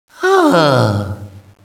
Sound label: sigh, Echo inside caves, underground; DS; ML BOWSERSINSIDE; Mario